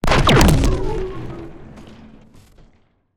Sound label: Sci-Fi Energy Explosion